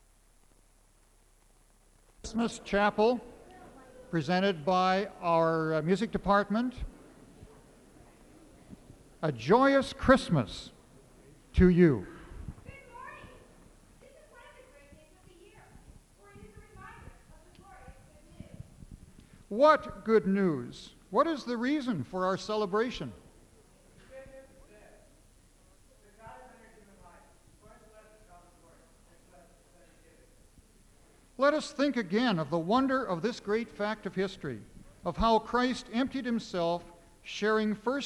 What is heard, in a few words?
Concert Chorale